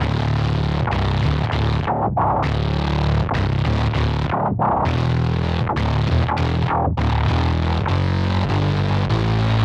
Synth Bass; Bass X

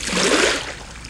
ROW, Boat Rowing

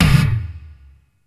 Gated Tom; Univerb; creative gate; Gated Reverb

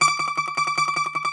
Greek Bazouki, Plucked Strings

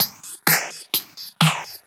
FX Loop 128 BPM, House

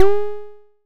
Blop; Bitkits; Blip & Blop

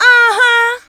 VOX, Singin

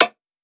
tearout bass; M8; percussion and foley

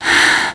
VOX; Zero G; Man Machine

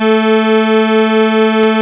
OSCAR A4, Synthesizer; Hollywood Edge - Giorgio Moroder Rare Synthesizer Collection

